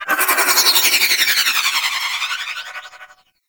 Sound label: Short Bow Projectile; Warframe; Duviri; Dax; Weapons